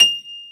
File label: PF, GRAND PIANO; AKAI S6000 CD-ROM - Volume 1